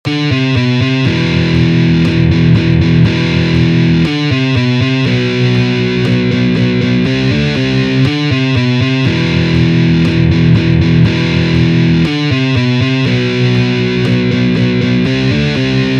Electric guitar riffs

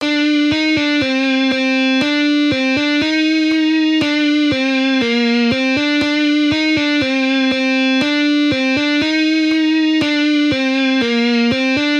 Easy guitar riffs on 1 string

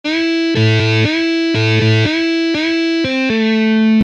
Electric guitar techniques

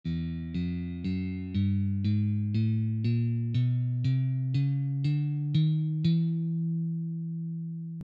Beginner guitar scales

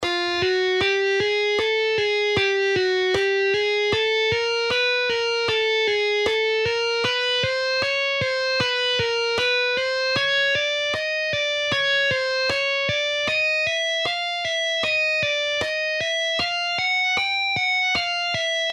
guitar finger exercises

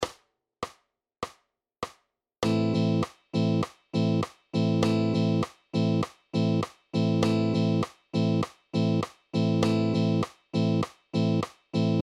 Rhythm guitar exercises

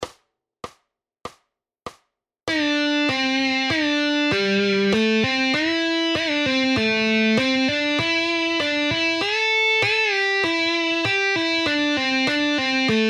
Advanced guitar exercises

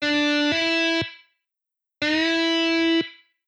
Guitar solo

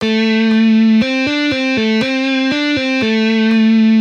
Easy guitar licks

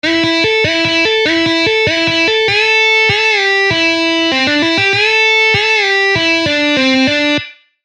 Guitar scale exercises

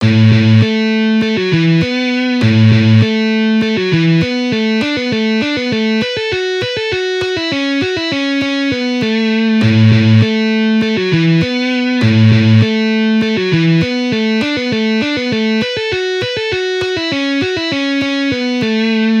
String skipping, guitar

